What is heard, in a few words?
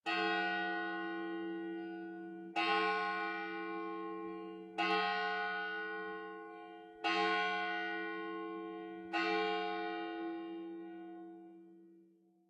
ambient port; World Of Warships; port3d